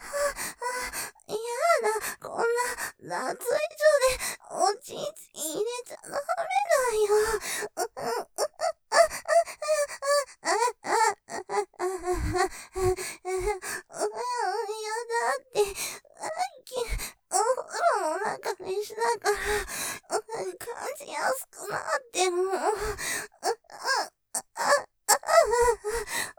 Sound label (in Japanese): 喘ぎ; やだぁ、こんな脱衣所でおちんちん入れちゃダメだよ; ループで楽しむ！シェフの気まぐれ抜き抜きコース